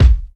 00s clear kick one shot a sharp key, Royality free steel kick drum sample tuned to the A# note; kick drum samples